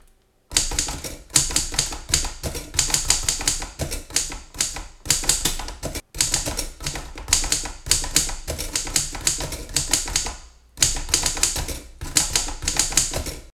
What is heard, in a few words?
typewriter